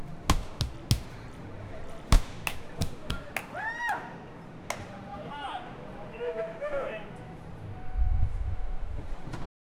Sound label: lively shouts ball taps, urban environment